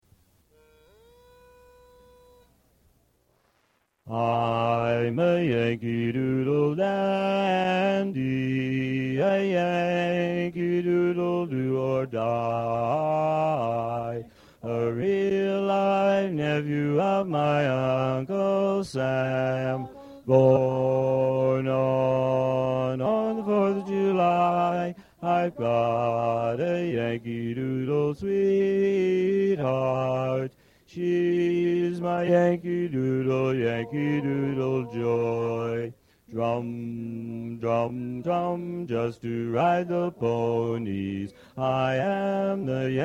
Bass

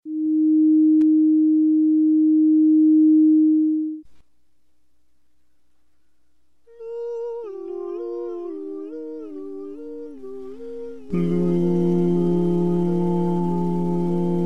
Rainbow Bass

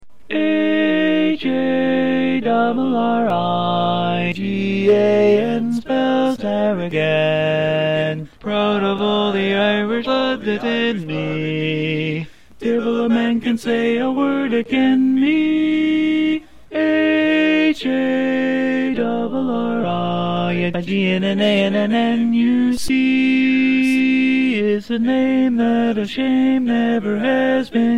Lead

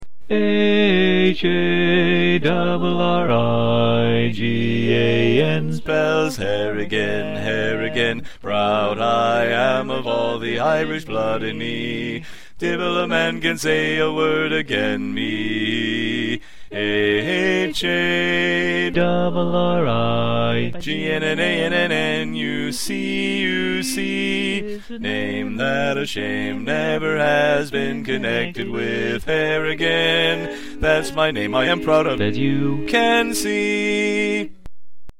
Bass

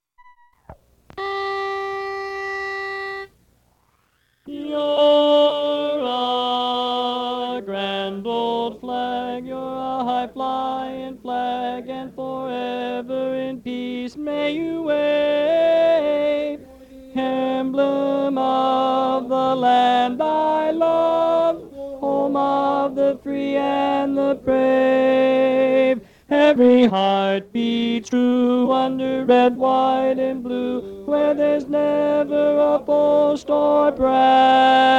Tenor

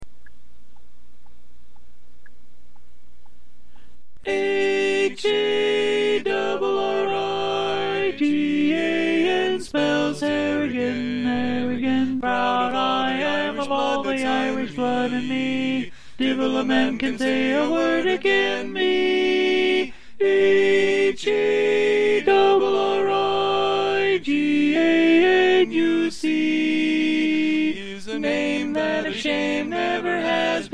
Tenor

